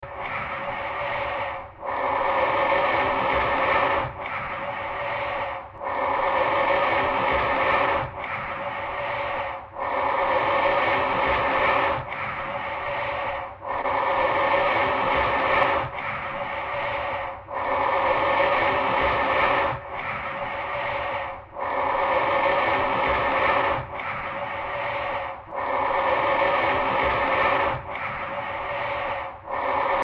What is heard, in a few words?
dark heavy breathing